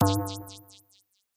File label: synth boing